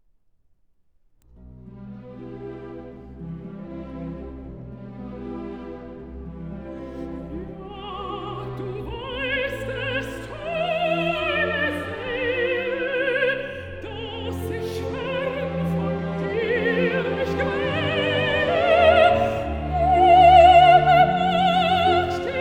song for voice & piano (or orchestra); Zueignung (Ja, du weisst es, teur Seele); Op. 101

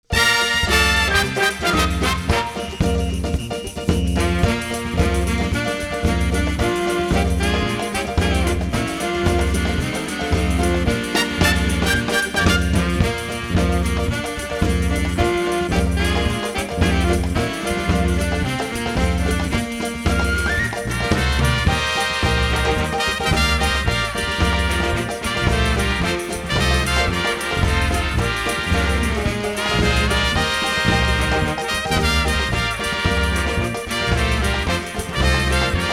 Samba

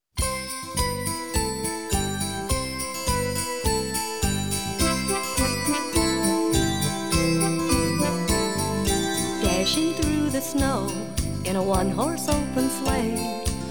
Jingle Bells

